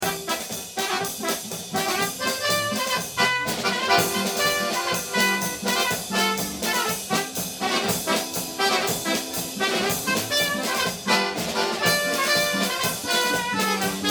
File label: brass